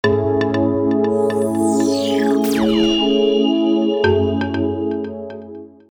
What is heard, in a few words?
boot audio; Explay; Blaze